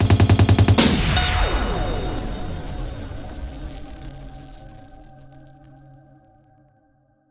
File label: Double Sweep